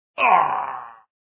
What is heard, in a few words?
Barbarian Dead; Mighty Knight 2